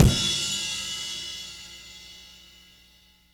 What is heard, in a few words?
fizz, Cymbals